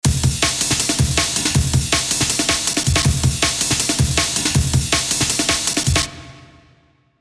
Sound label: 160 bpm drum break